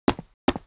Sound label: Mob raid run; Monster; 레이드; 무림대도연주범